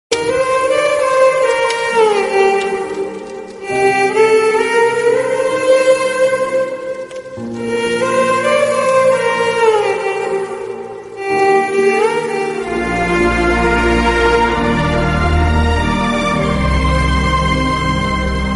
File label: emotional bgm, telugu bgm; Eega